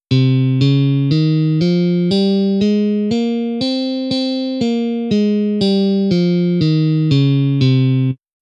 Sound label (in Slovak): stupnice elektricka gitara frygicka